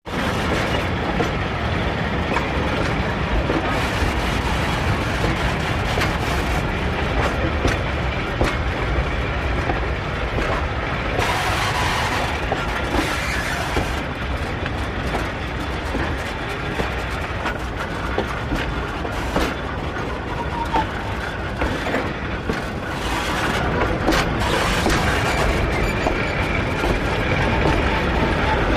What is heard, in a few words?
Steam Train Boxcar, steam train